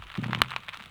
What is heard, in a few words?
loud growl; Gilligans Island; soniferous